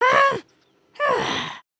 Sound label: frustrated